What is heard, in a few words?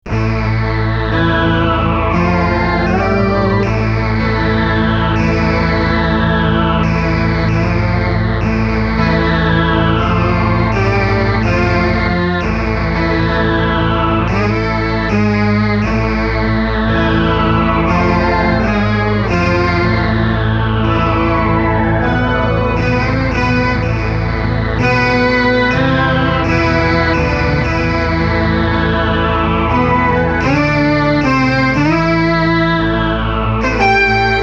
Preset Poly VI